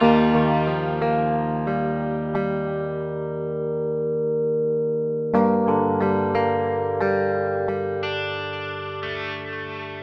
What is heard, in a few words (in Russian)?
zatsiklennye melodii dlia gitary loop